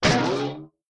Impact; LOOPERS

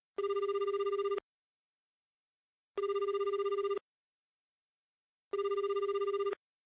Dial Tone; LOOPERS

